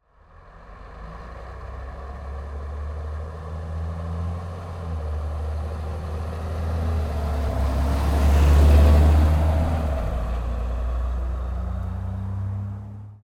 volvo f12 truck exterior slow passby; neumann; Sonniss GDC

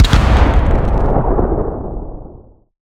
combat drone weapon explosion; bluezone; sonniss gdc

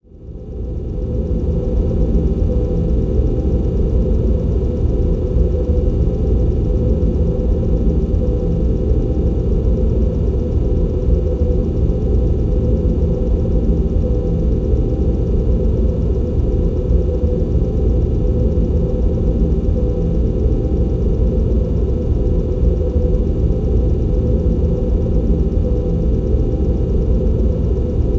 background underground lab ambience; sonniss gdc

